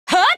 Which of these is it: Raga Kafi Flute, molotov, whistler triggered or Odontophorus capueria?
molotov